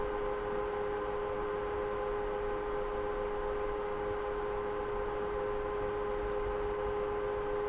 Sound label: cisco vent papst